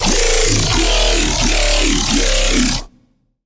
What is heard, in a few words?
Growl; Flaw Monster; Routed Sound; Serum 2 Expansion - Chaos Bringer Vol.1